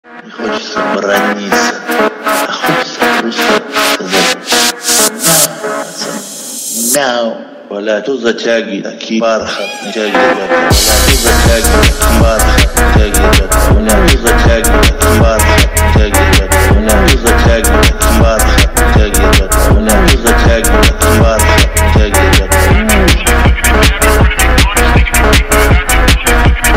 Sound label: phonk remix